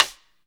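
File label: snare drum rim; Best Service Gigapack 2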